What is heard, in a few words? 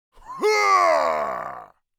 Orc creature long shriek during an attack